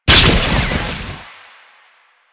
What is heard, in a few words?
Explosive Impact; Warframe; Akarius Prime